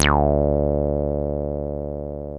303 C3, TB 303; Hollywood Edge; Giorgio Moroder Rare Synthesizer Collection

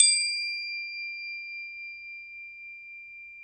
Crotales, Orchestral Perc

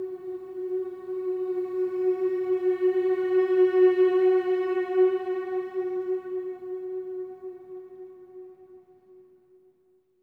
MM F#4; SWELL MMS

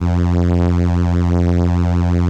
ELKA, String Machines; ELKA RHAP

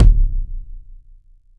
Bass Tom, Jomox Airbase99; Drum Machines

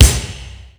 KICK, M1-M3R; M1-M3R KITS; Greytsounds VOL-1

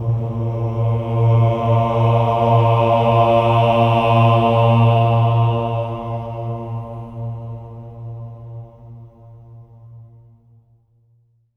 AH A#2, SWELL AHS, Symphony of Voices; Spectrasonics

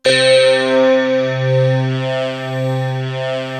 SPECTRM, KORG; East West - Global Sounds; WS KORG#2

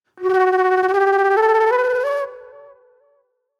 Sharp Delay Flute; Sonic Foundry (Sony Creative Software); Manhattan Flutes; Mixed Berry Jam

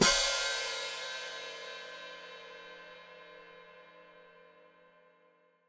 Hat Closed Open, Hi-Hat

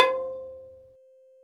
MALLET KAL; World O' Kalimba

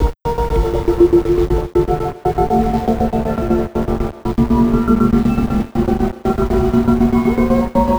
Envelope Beat; Drums & Drones

